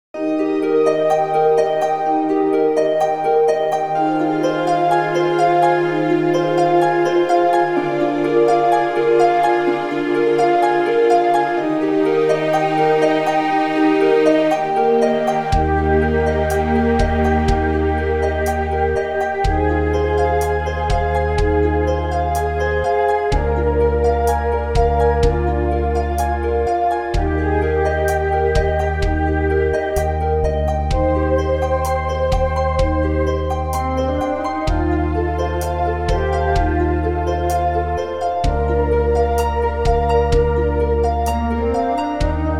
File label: brass; klassik; bach guno